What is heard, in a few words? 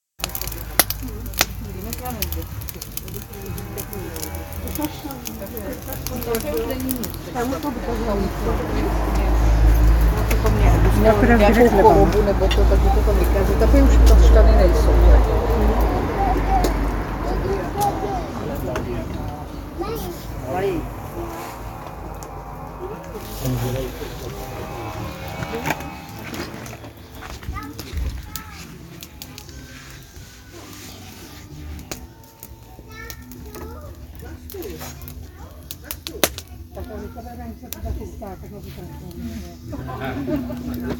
Bbq party, in nature